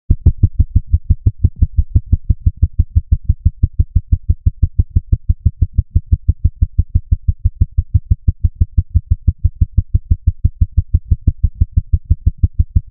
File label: soft rising tone suddenly; dying